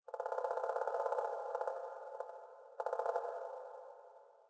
sting woodpecker; Rust

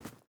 footstep burlap forest jump start; Rust

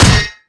arm punch generic

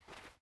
footstep burlap cloth walk; Rust

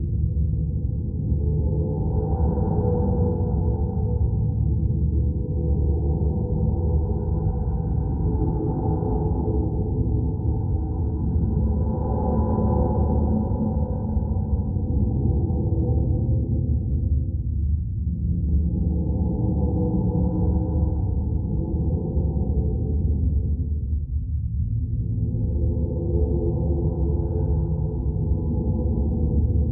ambient port default; World Of Warships

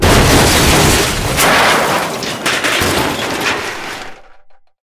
car wreck, tanks; War Thunder

